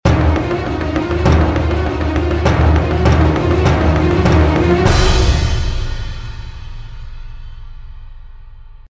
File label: Music